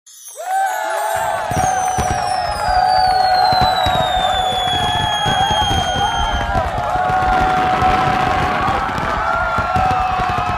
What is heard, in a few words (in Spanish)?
Fuegos Artificiales; Mobile